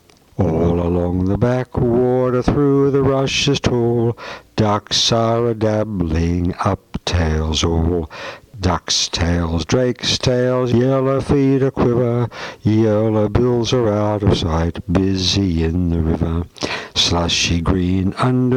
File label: singing; lilts